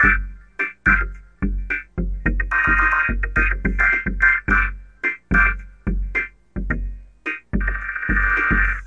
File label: 108 bpm vocoded drums